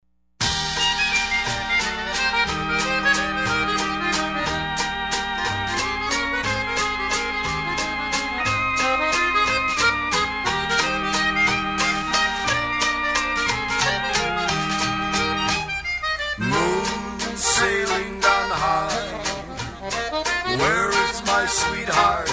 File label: Waltz